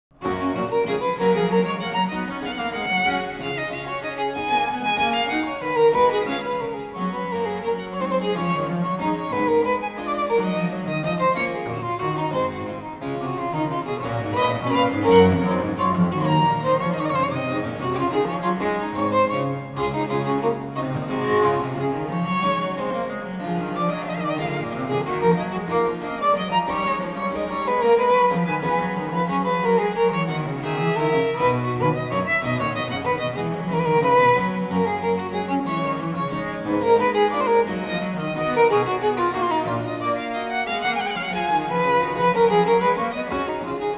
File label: Sonata in G major for violin & basso continuo, Vivace; J.S. Bach; BWV 1021; Orchestral Works & Chamber Music